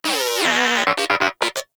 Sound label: Moa Inquisitive Vocal Disagree; Pets; Warframe